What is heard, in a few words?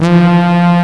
tuba; Inside Mac Games; X-Mas Lemmings Demo; Music